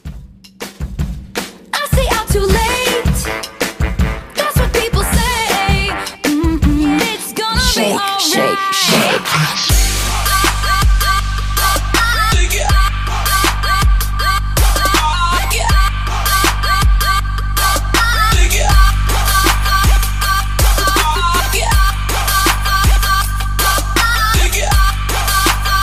Android Mix Ringtone

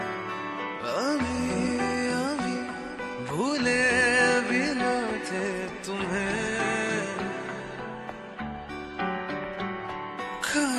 Serial Full Title Song Ringtone; Bepannah

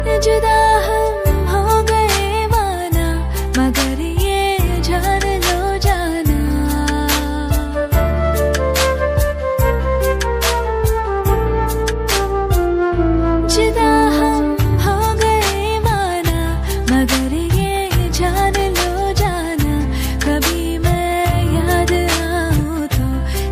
Female Ringtone

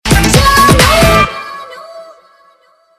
Sms Ringtone for Mobile; ringtones

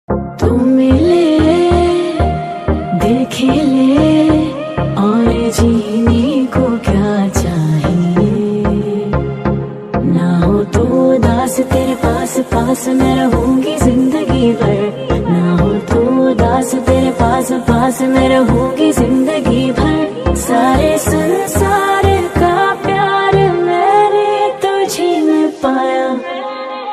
Old Hindi Female Version Ringtone

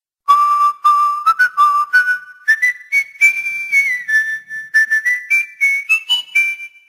Theme Music Ringtone

